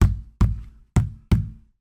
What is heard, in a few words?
tyre fully inflated kick 4x with boot; transportation; sound effects; sound effects one